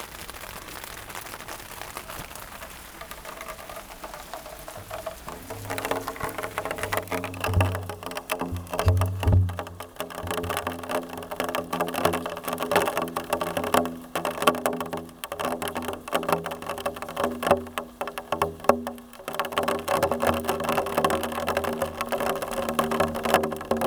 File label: Rain on Drum; Newcastle upon Tyne UK